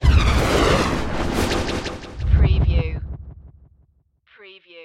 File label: Magical Flutter Swirl